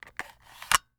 Technology Electronic Remote Control Removing Battery Cover